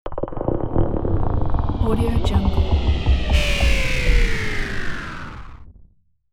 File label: scifi noise